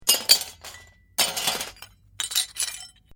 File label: China cups metal tray fragments collected; china cup breaking; Cleaning Sound Effects; Cleaning (Sound Effects:Household)